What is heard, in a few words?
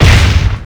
step; morbus